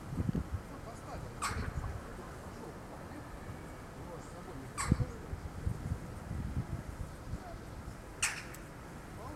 House Sparrow, Passer domesticus; Naminis žvirblis; Aves; iSoundNat; inaturalist

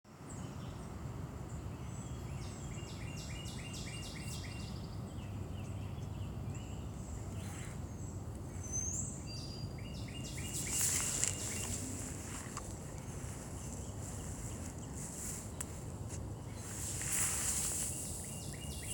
Lazuli Bunting, Passerina amoena; Aves; iSoundNat; inaturalist

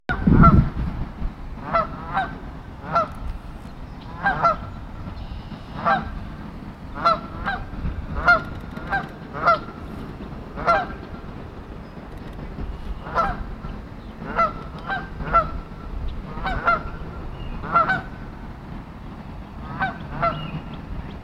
Rainbow Lorikeet, Trichoglossus moluccanus; Aves; iSoundNat; inaturalist

